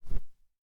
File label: clothes drop